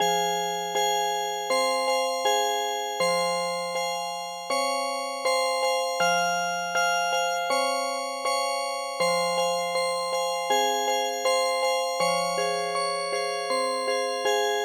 musicbox; Kazoeuta

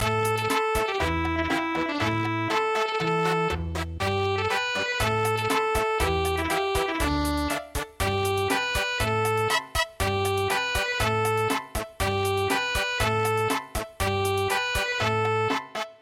Cha Cha Cha; Hide And Seek

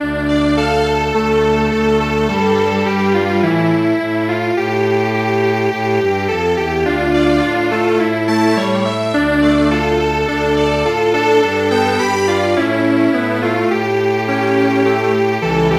Lullaby Strings Quartet; traditional; Norwegian

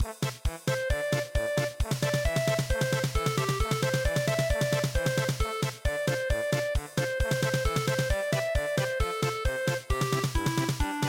polka, midi; dekansho

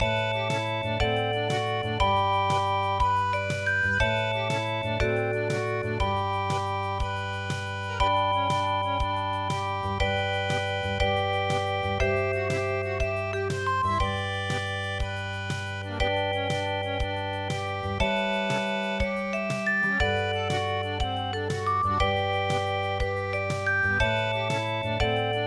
paris musette

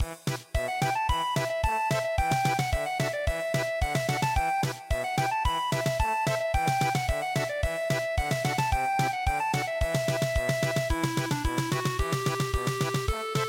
polka; Rusi Kosi

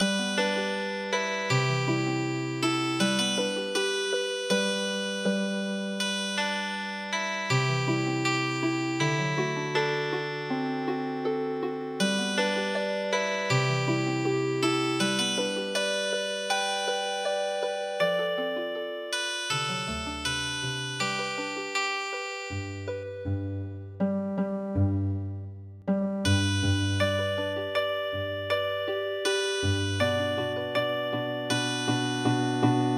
Healing Harp

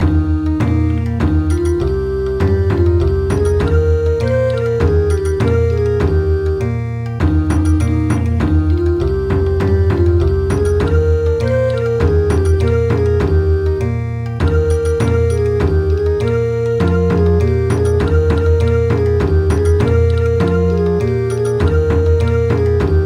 Bon Odori, midi; Heaven and Earth Rejoice Today

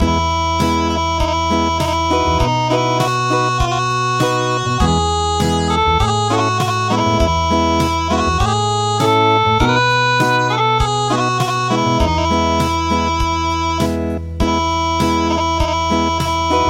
Acoustic; Happy New Year Greece

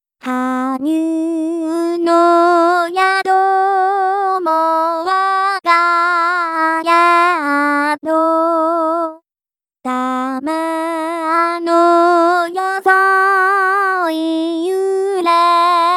vocal only

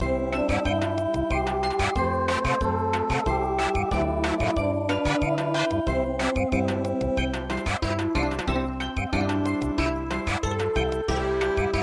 Samba

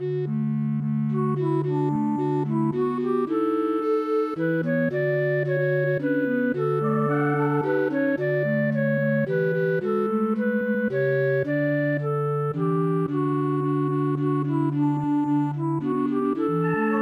Recorder Quartet; The First Nowell

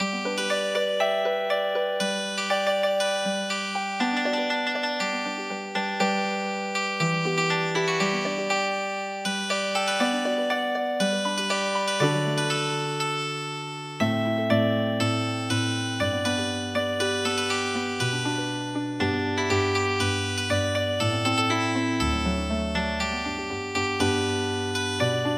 Healing Harp; traditional; YI YONG JUN JIN XING QU

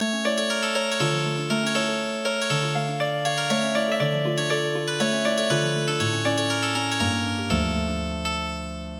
Healing Harp

